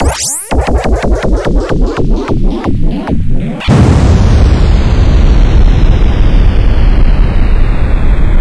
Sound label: jp air; cstrike